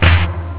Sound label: perc